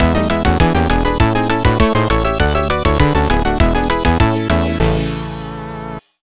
Complete; clue mouse trap perfection aggravation 2007 nds gamerip

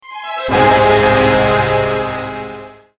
Mission Fail; Serious Sam 2

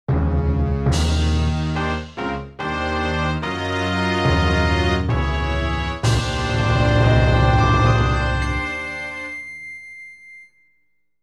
Boss Clear Fanfare; The Legend of Zelda Ocarina of Time 3D; 3DS

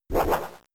Water Spout; Pokemon; Gen 3; attack moves; RSE

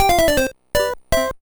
Death Jingle; super mario maker 2; SML

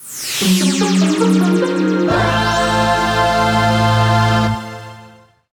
Goal Fanfare; crash bandicoot series sound box; Uranus' Mine; 2nd Place

